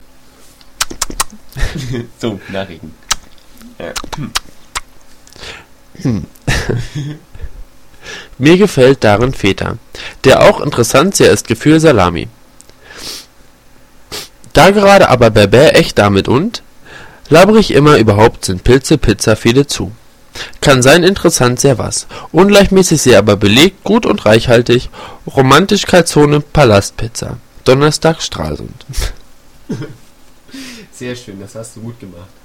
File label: pipa; Maelzer und Osn GbR